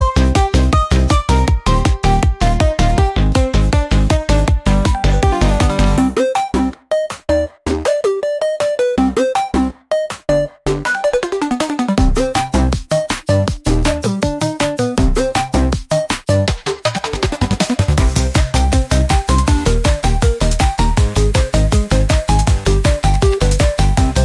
Retro electronic excitement

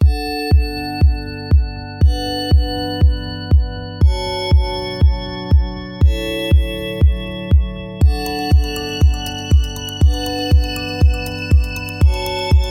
Electro Pop; MIUI14 and HyperOS 1.0

